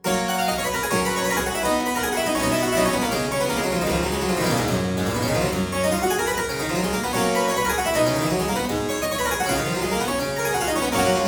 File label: Aria in G major Partita 23, Harpsichord